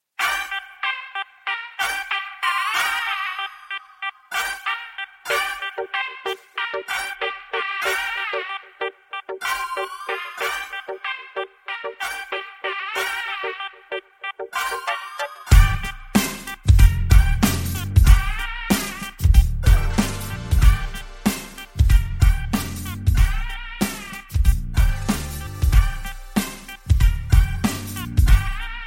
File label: beer bottle instrumental version; tamil bgms